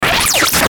Glitch Warped Laser Noise, High Pitch Whistle Electronic Descend; Production Element Imaging Element Accent Transition